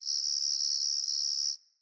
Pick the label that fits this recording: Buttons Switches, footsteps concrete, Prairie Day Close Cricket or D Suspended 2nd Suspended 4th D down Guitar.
Prairie Day Close Cricket